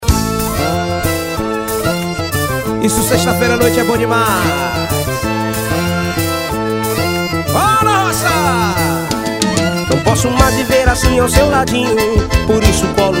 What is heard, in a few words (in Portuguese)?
forro rocado ao vivo